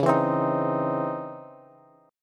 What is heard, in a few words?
Db Minor Major 9th Db Bass; strummed; DbmM9; JGuitar